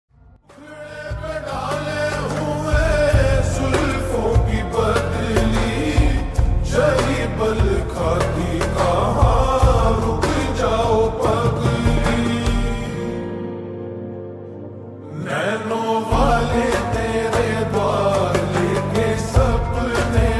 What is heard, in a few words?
Slowed Reverb Ringtone